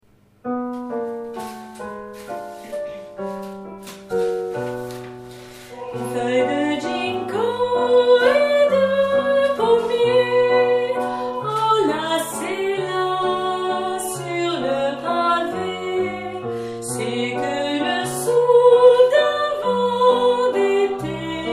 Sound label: alto